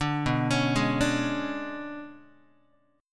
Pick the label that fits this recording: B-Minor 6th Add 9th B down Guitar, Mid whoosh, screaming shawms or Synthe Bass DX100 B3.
B-Minor 6th Add 9th B down Guitar